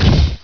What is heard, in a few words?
inv move; Hexen2